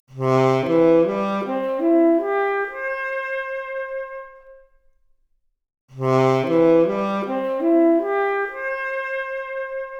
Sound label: Saxophone arp, Stereo output noised; 3bit